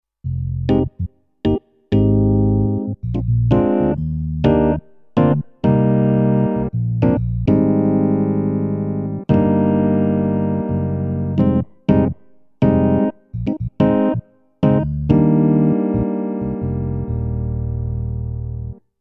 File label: Roland RP102 EPiano